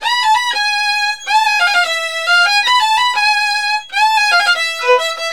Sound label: VIOL L, Balkanish Traditions; USB Soundscan vol.63; AKAI